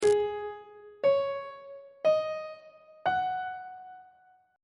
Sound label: g sharp dominant 7th suspended 4th chord intervals on treble clef